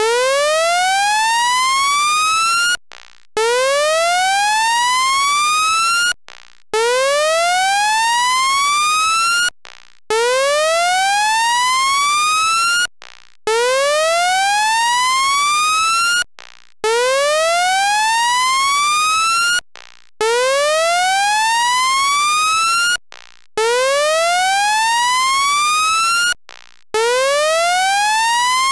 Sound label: collision alarm